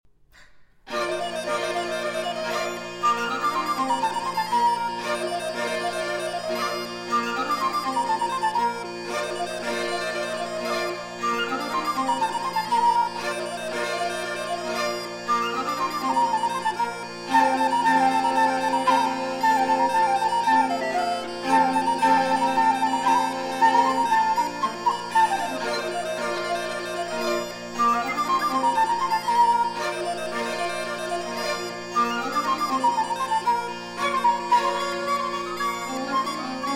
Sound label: Trio For Musette, Flute and Violin, Tambourins; Divertissement Champêtre; V. Tambourins I et II